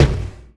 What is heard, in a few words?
Automatic GSh301 End In, Weapons; DCS